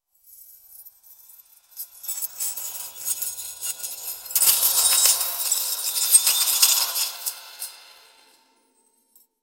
create the ambient sound, classroom

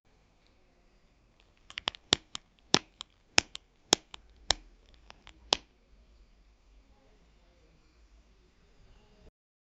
collectibles sound to col, collectible

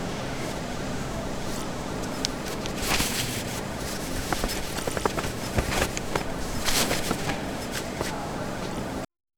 the sound of clothes when, shop

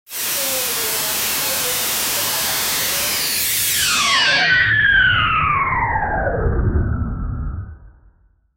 flaming pain effect, fire

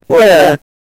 minimalist woodblock; wooden

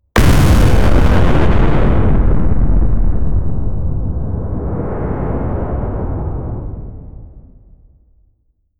create ship explosion for

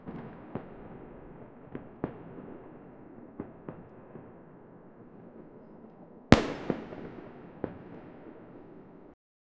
distant new year firework

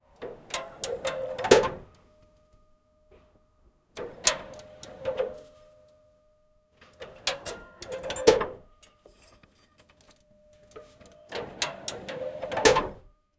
moving cable winch; industry

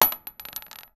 metal barrel bullet hit, short decay

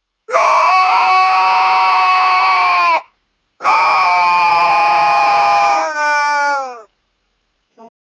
native american war cry; warfare

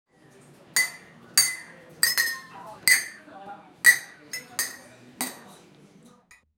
shop ambience light crowd

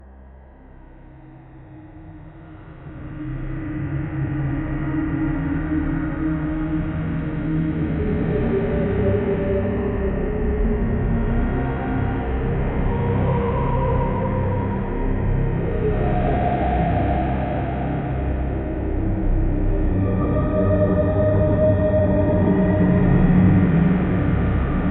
abandoned hospital scary, horror

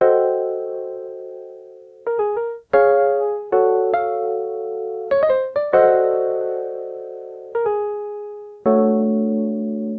warm melancholic minor lo

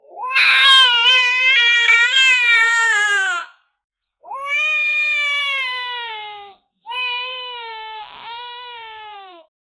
child crying

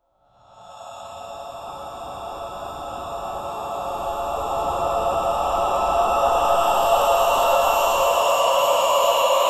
a chorus of reversed whis; breath

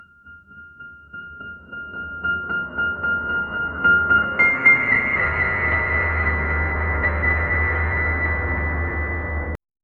soft piano over rising, drone

